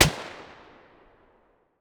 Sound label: WEAPON machine Pistol Shot; Weapons